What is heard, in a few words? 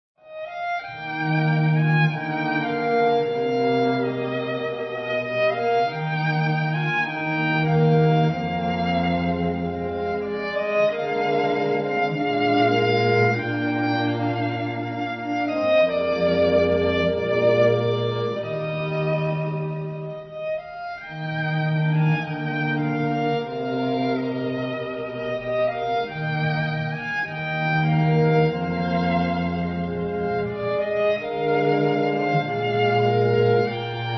String Quartet